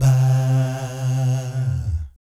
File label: VIB FX, Vocal Planet Jazz & FX; ILIO; JAZZCOMBO